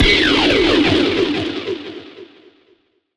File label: Anime Super Laser